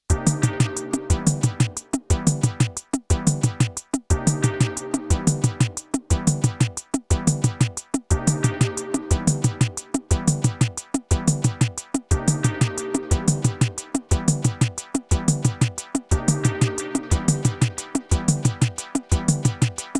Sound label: Korg drumlogue Steppa